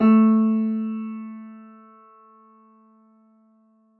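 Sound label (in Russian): пианино одиночный a3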